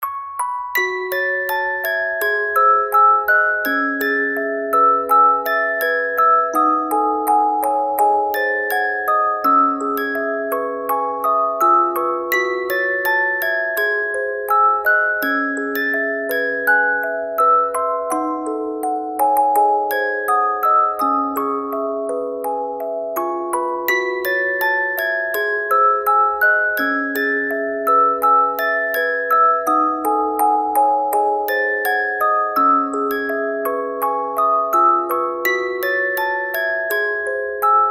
music box

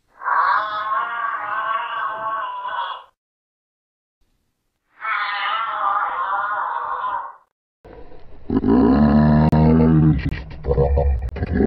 Real Giraffe Sound; Sonido de Jirafa Real Vrai Son de Girafe

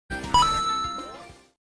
Cling; Cling sound effects

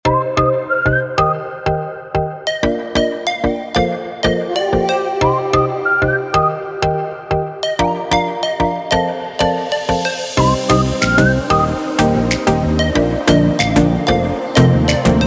sunlit whistle

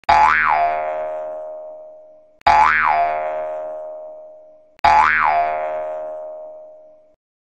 Jump Salto

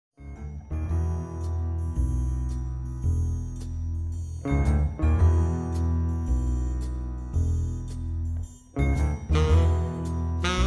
uk drill

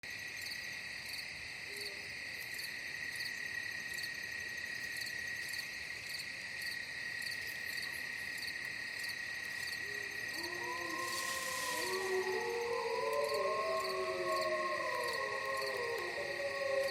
wolf forest asmr ambience; 1 hour; dnd rpg

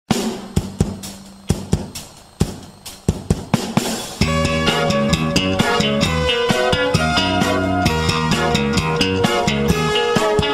Unknown Funk Boogie; Funk Inconnu; L4D2

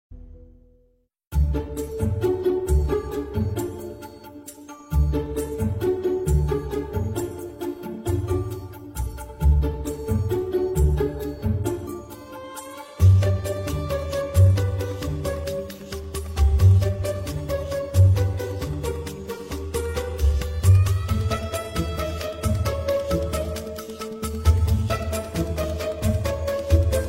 End Credit Music; Desperate Housewives

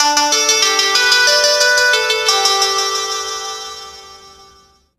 call ring

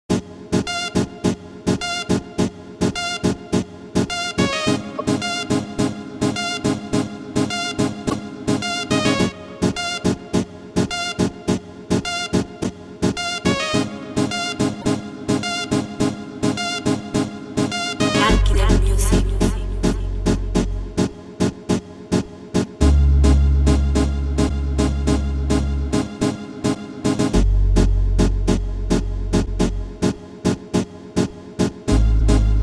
Remake Instrumental, Karaoke

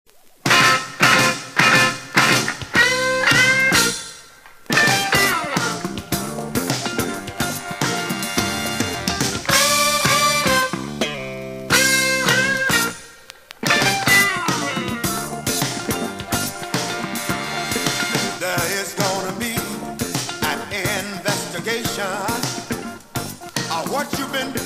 Funk

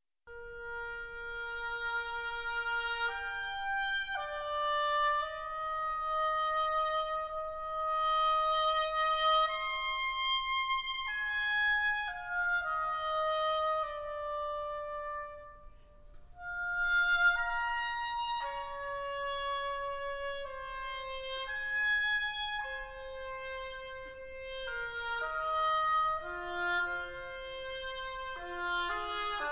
Oboe

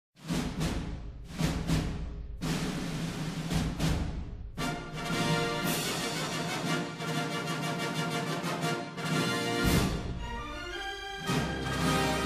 Intro Logo; 20th Century Fox

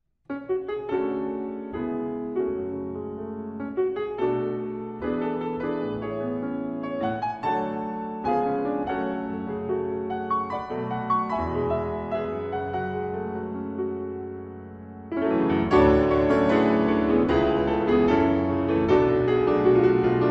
Piano; Naruto Shippuuden OP3

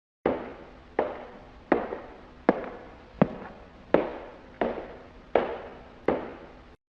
Sonido de pasos Footsteps Sound

